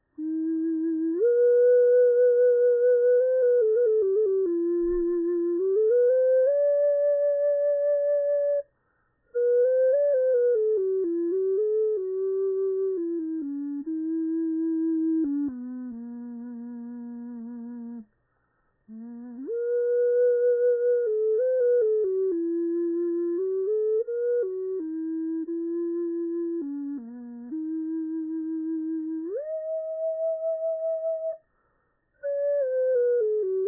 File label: bass improv, sweet potato